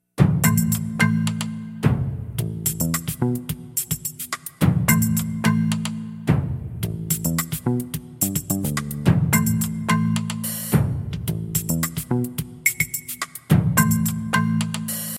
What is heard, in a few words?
tropical dreams ring tone